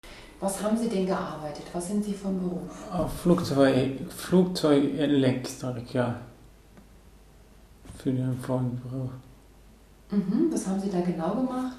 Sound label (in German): sax spontan